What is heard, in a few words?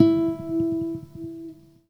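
classical solo, guitar; Greytsounds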